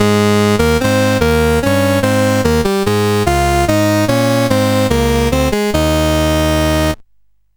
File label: Summer Games, CSG8580R5